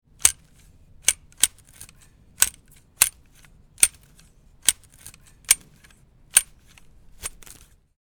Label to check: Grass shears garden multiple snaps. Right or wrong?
Right